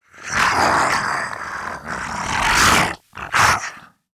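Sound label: idle, zombie male; nmr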